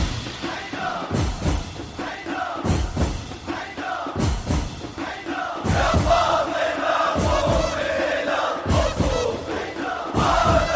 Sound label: Shia Noha Ringtone